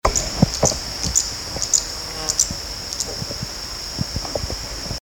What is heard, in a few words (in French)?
salangane soyeuse, chant